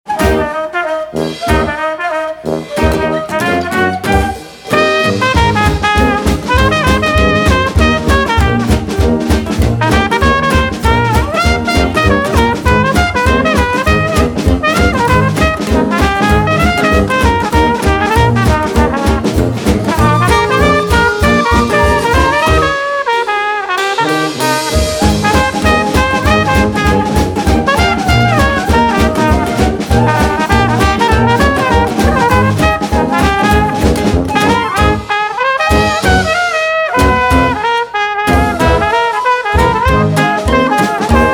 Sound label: dixieland quintet